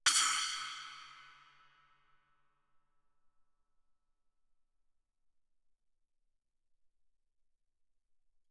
splash stick normal ff, suspended cymbals; Percussion

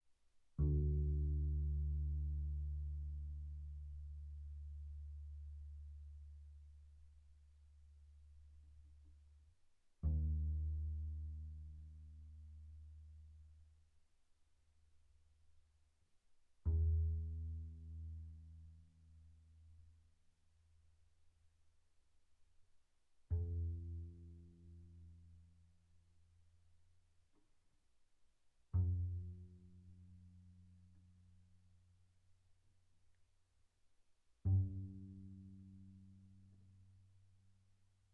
Bass pizz sul D pp D2 B2, double bass

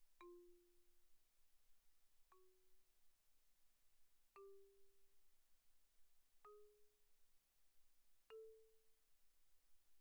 xylophone hard rubber pp F4 B4; Percussion

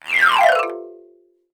Xylophone gliss down stereo; MIS Pitches - 2014; Percussion

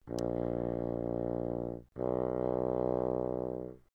Bassoon pp Bb1 B1, woodwinds

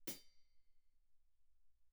hihat, shoulder, pp; Percussion; cymbals

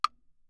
granite block, ff; Percussion; hand percussion; graniteblocks

